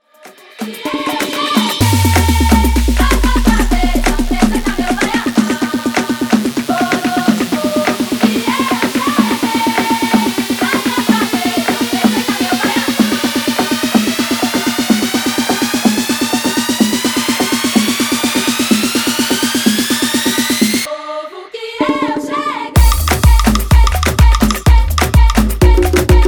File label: afro remix 126 bpm